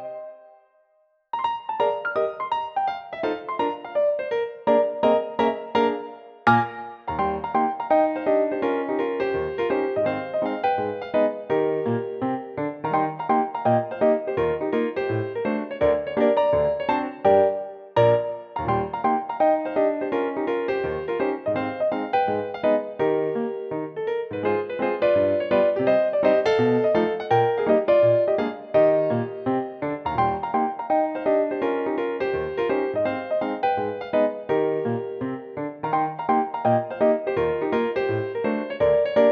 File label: akoustik bechstein cathedral ragtime; native instruments; akoustik piano; the grand